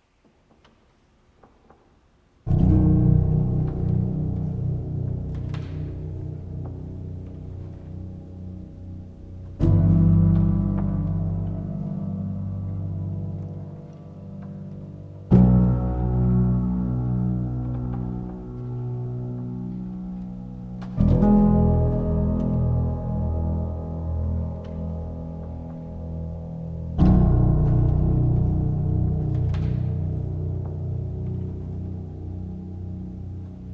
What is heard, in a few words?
Piano Reworks